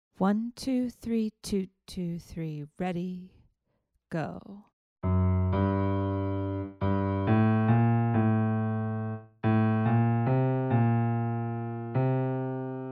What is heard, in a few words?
melodic dictation